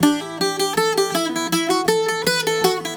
Celtic Flavours; USB Soundscan vol.42; AKAI

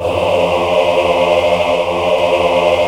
AAH; AMG - Vince Clarke Lucky Bastard

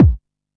Kick, Drum & Bass; Kicks; AMG - Prototype Drum & Bass

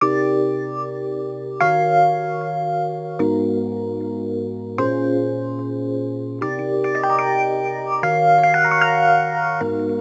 Rhodes Attacks; Ueberschall

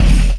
Jungle Kick; Sonic Foundry (Sony Creative Software); Esoterik Beatz; Jungle Kit

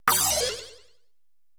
PERC MIX

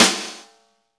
snare, Roland

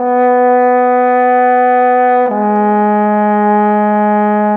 Horns, Cinematic; Sonic Foundry (Sony Creative Software); Orchestral Series VOL-3 - Cinematic

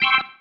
Stab, Organ, One Shots

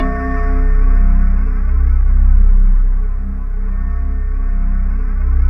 C1, Synth Leads

